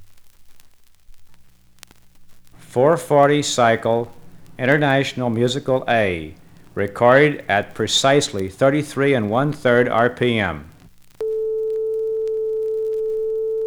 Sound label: Test vinyl 440; 1957 vinyl test record